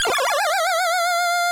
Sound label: Weird Reverse